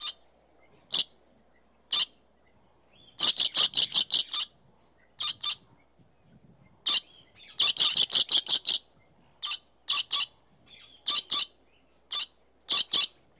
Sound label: Lory Chirps Sngl To Ra